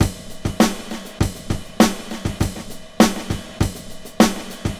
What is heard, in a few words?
Break Chopped 100 bpm, Drums; Loops; Ableton Live 12 Lite; Core Library